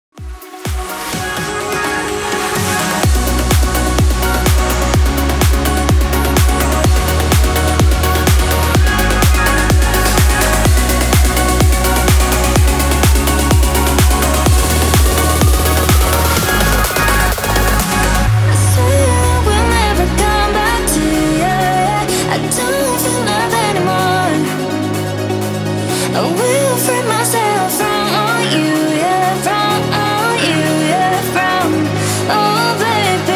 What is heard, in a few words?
126 BPM